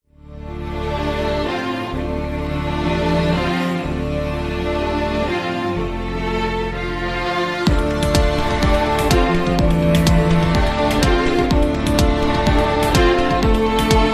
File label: Strings